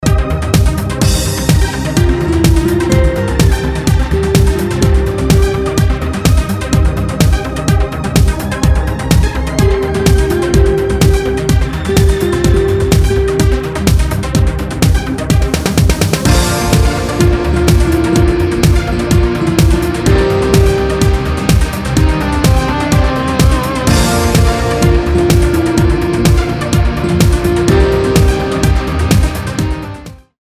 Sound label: Karaoke Song